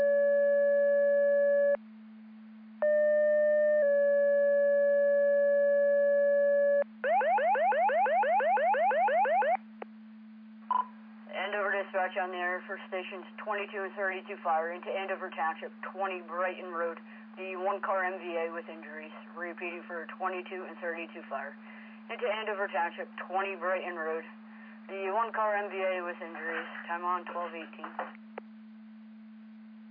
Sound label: Siren; Andover Twp Boro